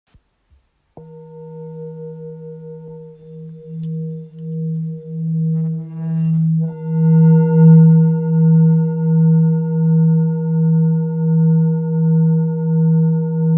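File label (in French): Bol therapeute do, bols chantants tibétains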